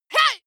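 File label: female combat attack short, screams; schreie; menschen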